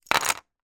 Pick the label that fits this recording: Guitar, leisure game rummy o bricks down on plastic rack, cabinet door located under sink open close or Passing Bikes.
leisure game rummy o bricks down on plastic rack